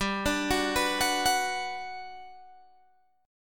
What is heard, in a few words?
G Major 7th, G, down, Guitar, Open G